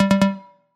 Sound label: alternative